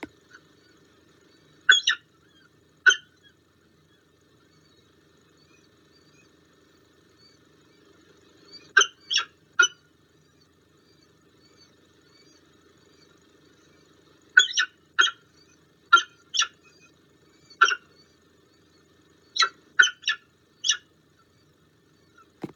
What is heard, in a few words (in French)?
Pelerin cris femelle nourrit